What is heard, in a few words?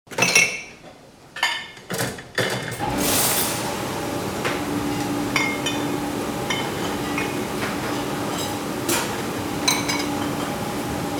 dishes washing